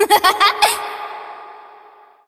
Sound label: laugh